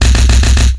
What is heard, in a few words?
heavy punch; attack